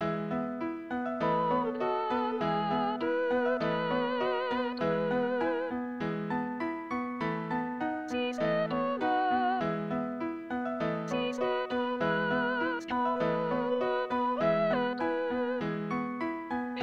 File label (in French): RIP Basse; PLANQUETTE